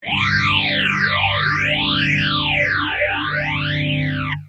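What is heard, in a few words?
guitar rph10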